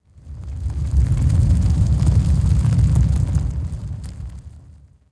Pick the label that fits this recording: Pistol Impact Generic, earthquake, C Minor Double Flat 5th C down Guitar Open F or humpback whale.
earthquake